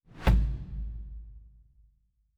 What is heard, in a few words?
Special Click; Fantasy Sounds Bundle